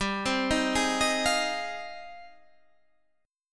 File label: G sharp Major 13th